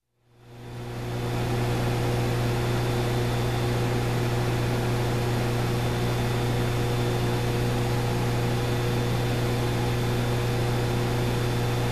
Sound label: Manufacturing Machine running up-close